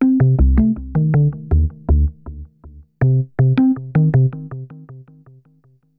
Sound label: SNTH BASS AMBNT 160 C; Ambient